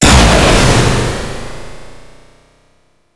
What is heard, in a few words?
Drone Explode; Super Drone Osprey Min